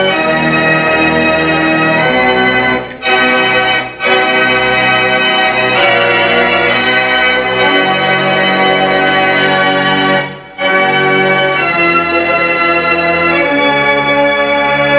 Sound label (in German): Orgelspiel; Kufstein